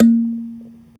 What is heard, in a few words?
thumbpiano A#3